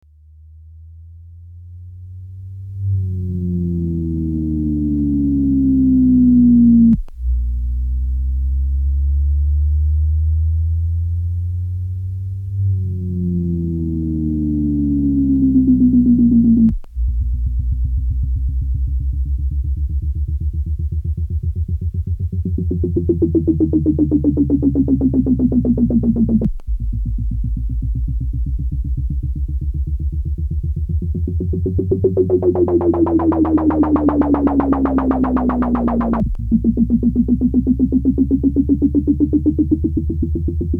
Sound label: Retrowave R1 Rising Falling